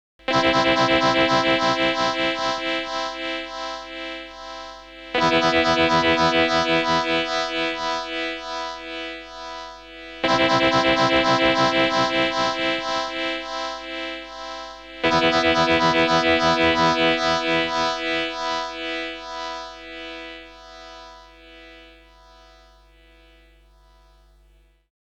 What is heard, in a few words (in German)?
Radius Synth Freq Shifter mit Envelope; Red Panda